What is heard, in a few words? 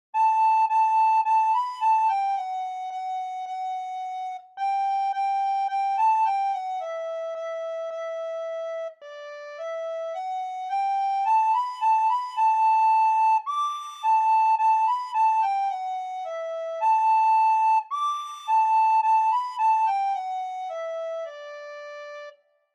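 soprano recorder solo; Lasst uns froh und munter sein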